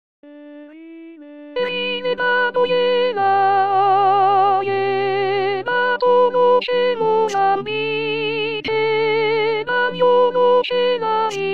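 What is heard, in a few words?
soprano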